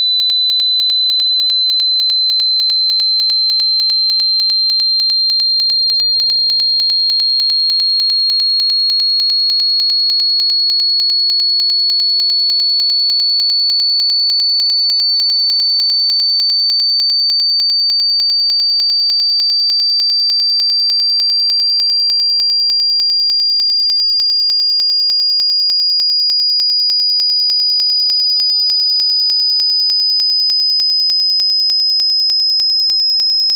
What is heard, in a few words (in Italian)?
frequenza modulata 4k 6k; acufenici; alcuni suoni acufenici